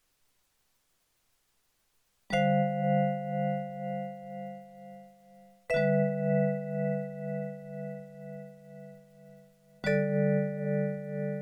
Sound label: Piano Vib Intro